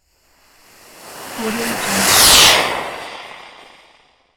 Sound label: missile fly by